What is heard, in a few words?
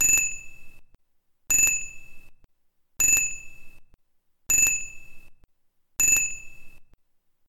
ring